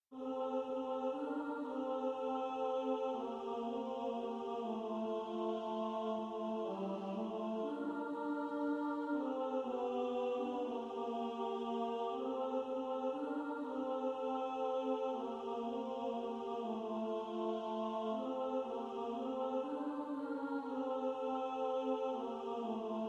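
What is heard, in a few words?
tenor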